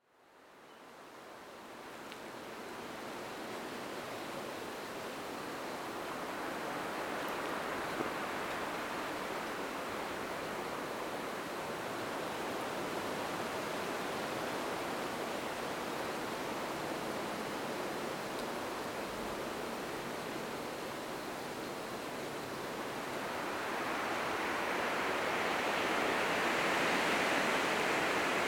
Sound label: Forest - Wind through pine trees - Manteo NC - 3D binaural ASMR; audio only